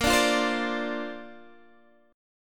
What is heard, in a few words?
A sharp Major Add 9th, Guitar, Drop D